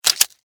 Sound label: Insect Claw Hard, Snap, Movement, Bug; Animal; BlastWave